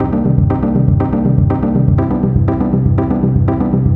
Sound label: synth arp; Electrocution